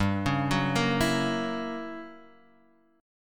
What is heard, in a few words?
F sharp 7th G, chord; arpeggiated; JGuitar